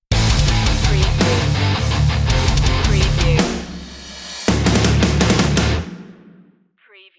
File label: battle ready stinger